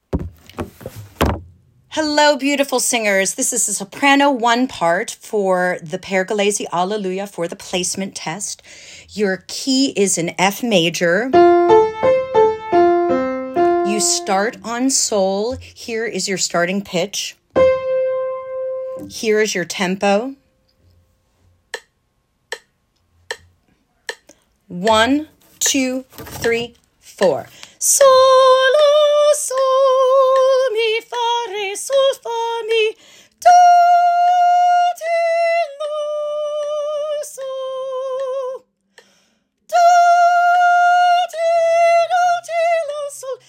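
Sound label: soprano alleluia slow on solfege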